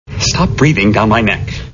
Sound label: breathing down neck